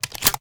reload start shellin, sh; iw8; romeo870; gmod

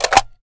ar reload empty fast mag out, weapons; viper

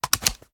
reload fast mag out; gmod; iw8; uzulu

